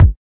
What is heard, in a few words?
kick